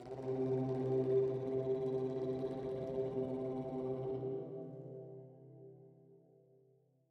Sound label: Blowfish C